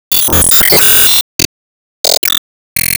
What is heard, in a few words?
Score Plus Waveclear